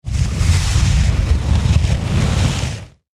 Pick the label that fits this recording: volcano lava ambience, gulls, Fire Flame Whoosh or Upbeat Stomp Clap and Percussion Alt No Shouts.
Fire Flame Whoosh